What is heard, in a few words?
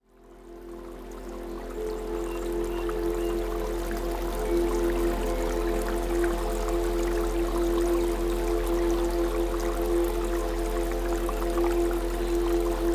Scary Sound Effects